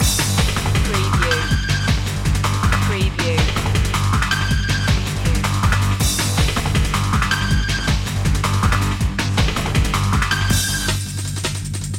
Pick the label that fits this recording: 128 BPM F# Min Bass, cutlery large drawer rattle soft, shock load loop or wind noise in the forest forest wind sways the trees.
shock load loop